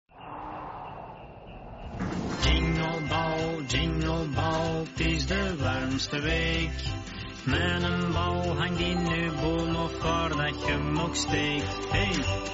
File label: jingle ball